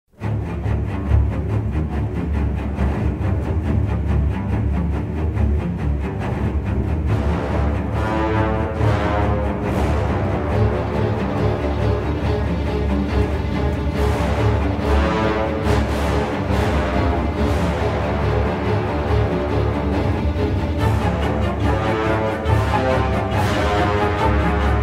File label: Horror Music